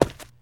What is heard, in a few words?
tile, footsteps infected run